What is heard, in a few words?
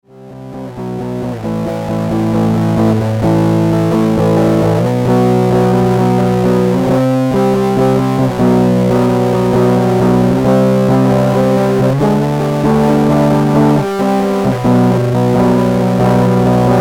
fuzz